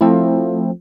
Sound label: wurlitzer, dx7